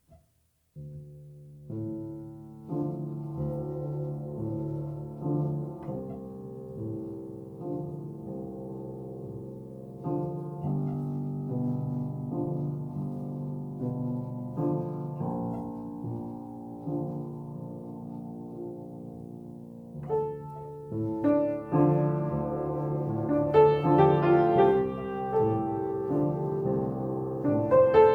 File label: piano version; surrounded; weeklybeats 2012